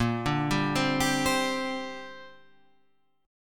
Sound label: A sharp 6th Add 9th, Guitar Half Step Down; A#6add9